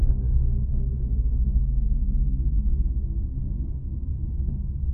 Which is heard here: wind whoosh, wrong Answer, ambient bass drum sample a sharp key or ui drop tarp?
wind whoosh